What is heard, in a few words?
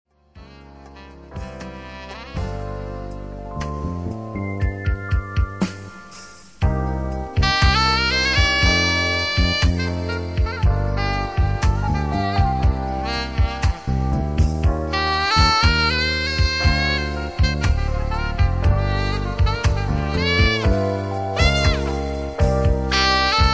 Sax